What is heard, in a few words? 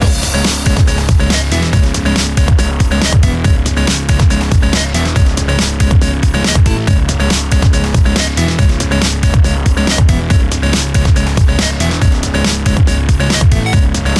electro; battle city